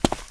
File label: Knight Run; Seer; GamigoNA